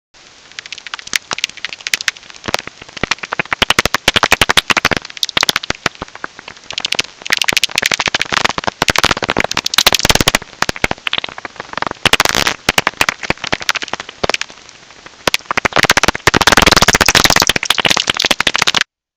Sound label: Soprano Pipistrelle, bat